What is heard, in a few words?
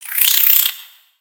Spider Exert; Lego; creatures mechanical